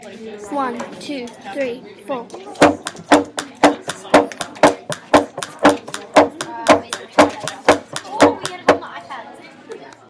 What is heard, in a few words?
Music Sample ostinato